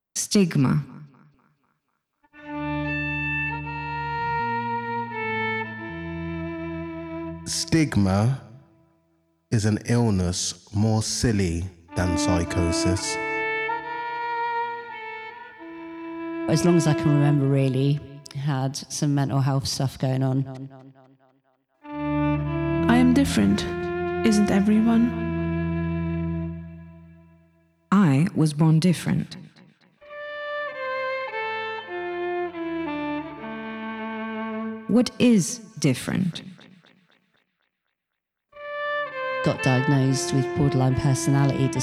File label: Soundscape (with singing)